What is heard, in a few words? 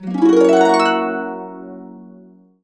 GB, Complete Harps; USB Soundscan vol.62; AKAI